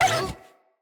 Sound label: Kubrow Feral Vocal Pain